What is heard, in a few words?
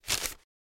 Paper Grab Softer; paper mvmt